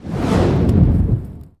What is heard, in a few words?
FLAME BY